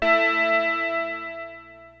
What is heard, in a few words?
PPG A3, Rare Synthesizer; Hollywood Edge; Giorgio Moroder Rare Synthesizer Collection; PPG.2.3